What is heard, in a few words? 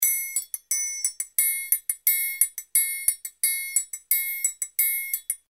TRIANGLE